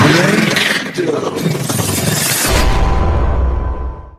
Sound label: Riser Breakdown